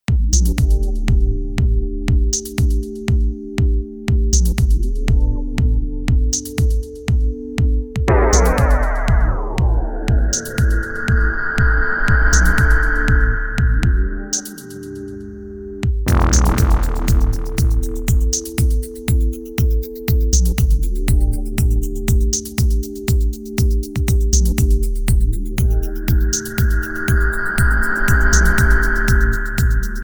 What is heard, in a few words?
off melody